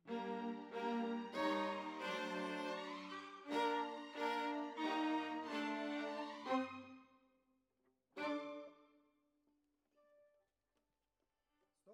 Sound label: Violas, jazz for piano